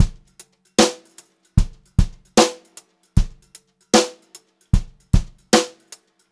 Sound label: brushed drum loops, beat; USB Soundscan vol.39; AKAI; 76 HOTROD